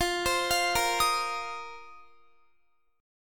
F 7th Suspended 4th, Guitar; arpeggiated

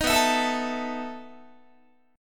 B 11th Guitar; strummed

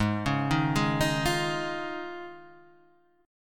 D sharp 7th Suspended 2nd Suspended 4th G sharp

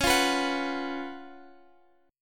C sharp 11th; strummed; C#11; 21,20,21,23,x,x; JGuitar

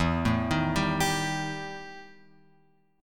E Minor Major 7th Double Flat 5th E; EmM7bb5; {0 0 1 0 X 3} arpeggiated

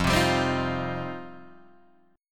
F 7th Suspended 2nd F Guitar Open D

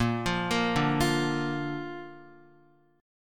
Bb Major Bb down Guitar; arpeggiated; JGuitar

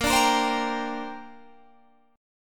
A sharp 6th Add 9th, Guitar Standard; A#6add9 strummed

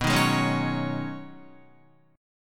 B 6th Add 9th B Guitar, chord; strummed

